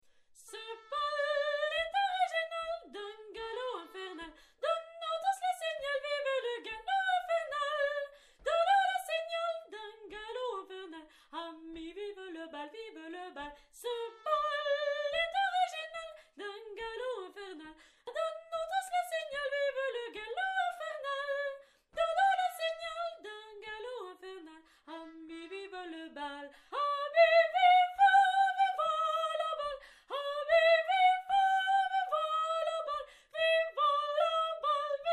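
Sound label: galop soprano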